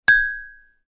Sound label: UI Quirky